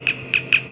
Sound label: chirp, communicator; TOS